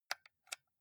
console controller xbox home press slow

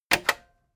cassette eject click fast heavy

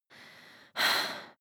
breath female mouth in out

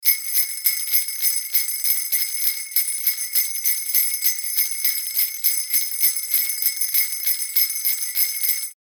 xmas bells

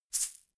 shaker plastic egg hit double hard

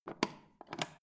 foot switch turn on off soft

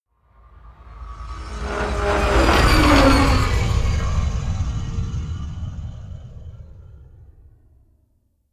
spaceship fly by

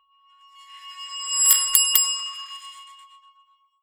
notification bell

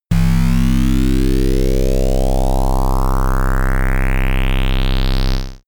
teleport

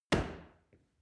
paw kick soft

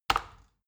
plastic button tiny click heavy fast

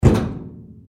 fx metal dong

